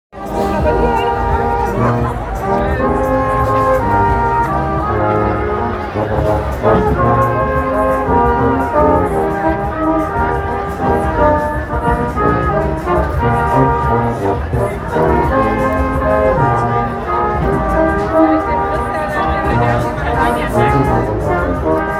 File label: live band merry go round oktoberfest; octoberfest